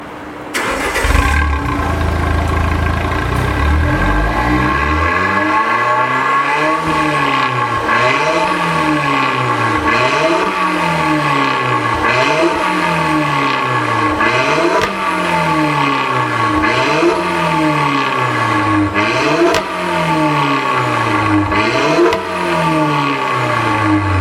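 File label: Giulietta mufler center blip, Alfa Romeo; unicorse-italial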